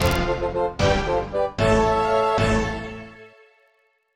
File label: Jingle; Tiger Woods PGA Tour 2004 (Game Boy Advance)